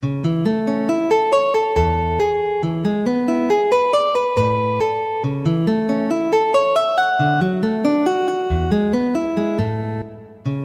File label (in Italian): valses, guitar